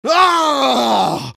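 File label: pain critical death; engineer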